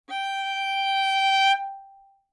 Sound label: Violin arco ff sul A G5; MIS Pitches - 2014; Strings